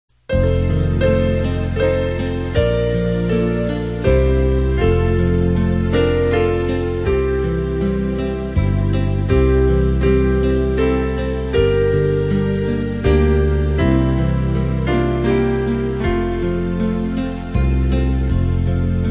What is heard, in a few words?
BASS